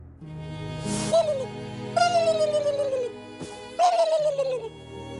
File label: repulsive alien hysterics